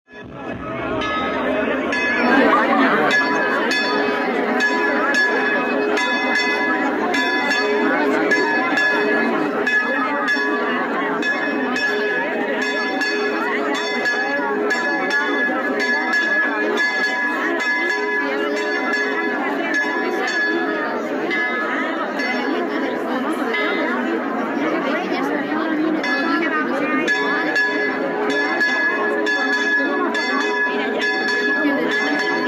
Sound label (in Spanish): Ermita Virgen de la Cabeza, campanas